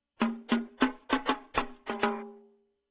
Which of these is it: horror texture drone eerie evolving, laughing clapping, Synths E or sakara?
sakara